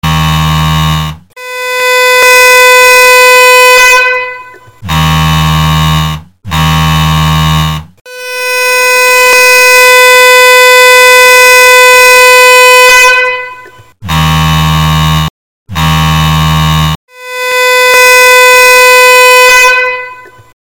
Cool Clockalarm Horn

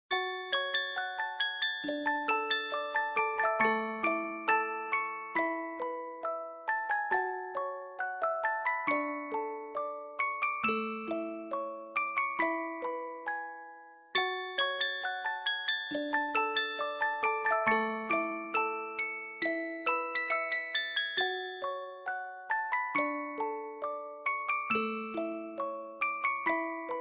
Music Box

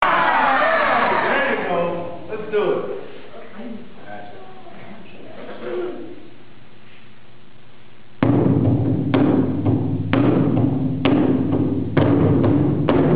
Singers

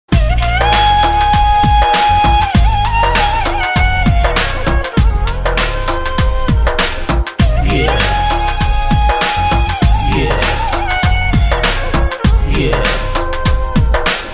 Romantic Bansuri

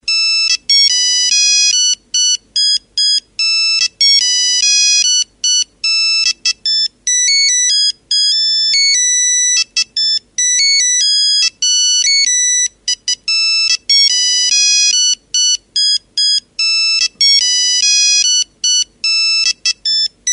Polyphonic; Rambo

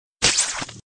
Web shooter

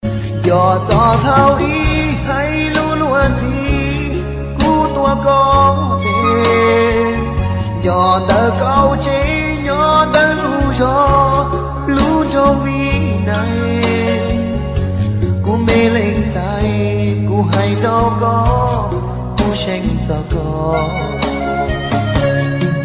Hmong Song

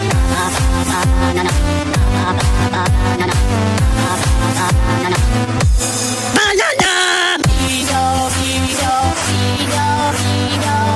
dance mix; minion

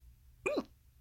Hiccup

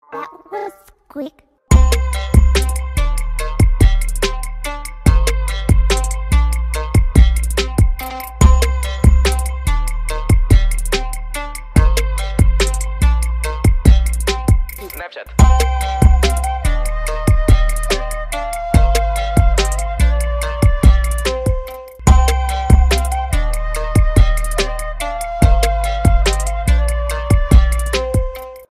Insrumental

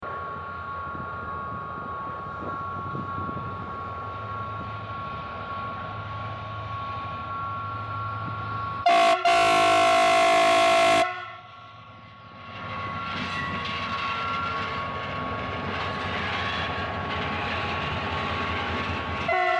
horn; FGC 254

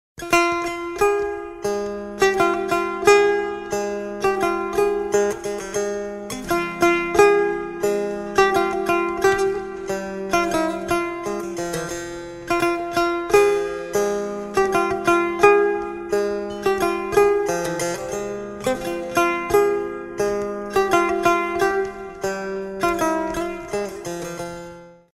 setar

